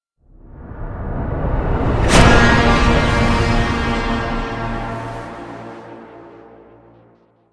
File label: Stinger Swell 5 Seconds